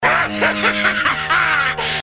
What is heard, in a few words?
Laughing; Starscream